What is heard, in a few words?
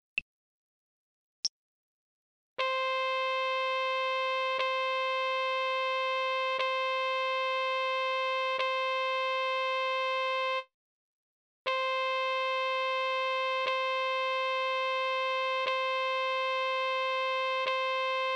c trumpet